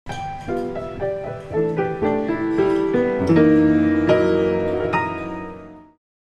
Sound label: Barpianman